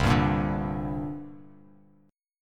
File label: C 7th Suspended 2nd, C, down, Bass Standard; C7sus2 strummed; JGuitar